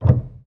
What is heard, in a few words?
piano release